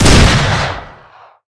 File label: fire; weapons; m16; arccw ud